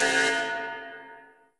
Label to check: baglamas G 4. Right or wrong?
Wrong